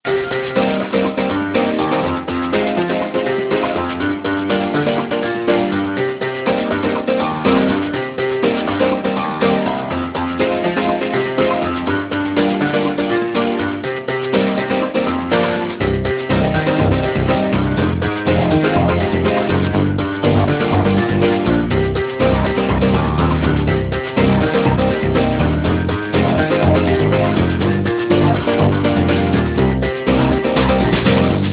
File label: ringtone new age fantasy